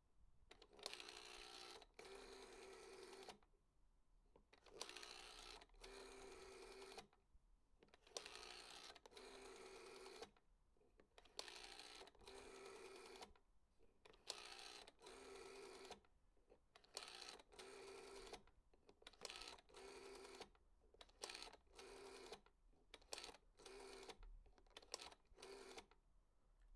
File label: Old Rotary Telephone Dialing